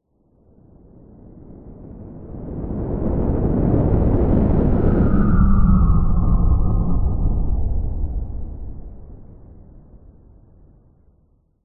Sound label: science fiction spacecraft mothership large sized electric fly overhead whistle; hum